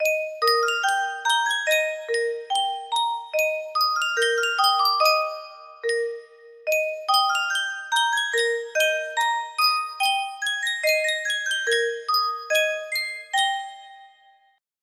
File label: Yunsheng music box, music box melody